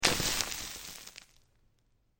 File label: attack dirt hit, Frostcaller; RYME